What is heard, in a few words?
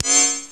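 night vision on; svencoop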